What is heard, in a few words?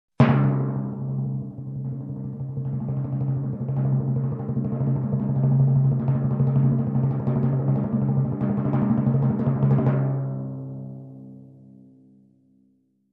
Long medium timpani crescendo